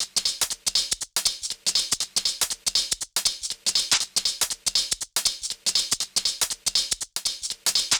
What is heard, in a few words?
Hh Sh Loop 120; Equipped Music; Loungin House